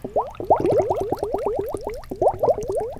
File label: less liquidy